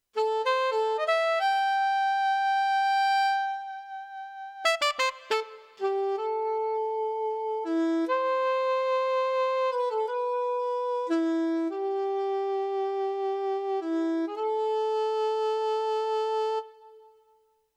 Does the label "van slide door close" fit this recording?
No